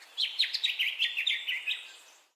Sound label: bird call; children of morta